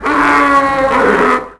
howl, creatures; tusken; Star Wars Obi-Wan; XBOX

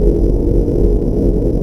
Internal Engine Loop, Space

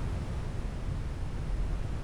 outdoor; Soldier Of Fortune II Double Helix; XBOX; ambience

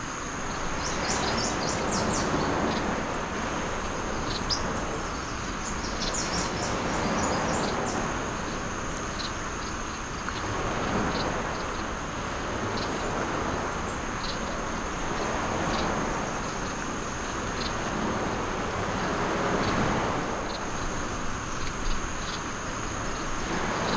ambience; Street Hoops; XBOX

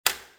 fence start, fence gate; movers; doors; Soldier Of Fortune II Double Helix; XBOX

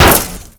Sweetner Metal, impacts; Totaled; XBOX

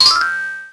Confirm

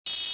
fuelpump; ambience; Star Wars Jedi Knight II Jedi Outcast; XBOX

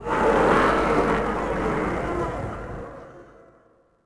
walla cheer; tusken; Star Wars Obi-Wan; XBOX

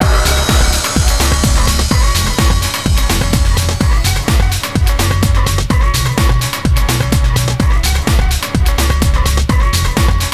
flames music

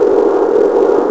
idle, ships engine; Quantum Redshift; jaya

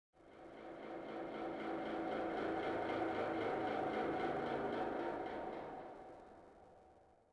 old fan; ambience; Soldier Of Fortune II Double Helix; XBOX; kamchatka

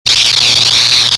saber block; weapons

